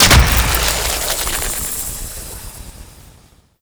Gardening Cactus Explosion; Warframe; Duviri

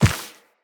Walk Dirt, Footsteps; Operator

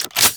Crossbow Reload Eject, Weapons; Warframe; Tenno; Garuda

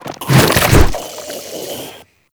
Proto Infested Ancient Strike Flesh; Warframe; Abilities

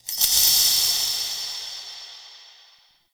Interior Orbital Station Metal Shake

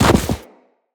Run Deep Snow, Footsteps; Characters; Operator

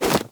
Arm Swing Foley; Warframe; Purgatory Warrior

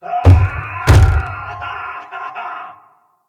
Castle Siege Roof Boss Bang Door D; Encounters

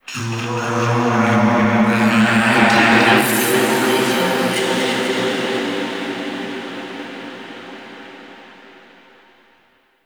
Cave Echoes; The War Within; Golden Maw; Queen

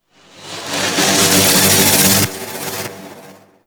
Blades Charge; Warframe; Garuda

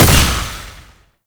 Pistol Projectile Explosion; Weapons; Warframe; Tenno; Temple Signature

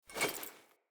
Player Short Sword Recall; Weapons; Swords

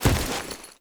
Tenno Vault Up Generic, Foley; Warframe

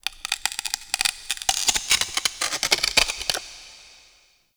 Terrarium Glass Stress; Warframe; Ambience; Entrati

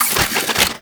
Fist Spin; Enemies; Warframe; Tyl Regor

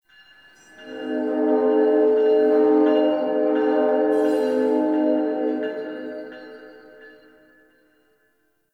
Distant Train A; Warframe; Ambience; NineteenNinetyNine

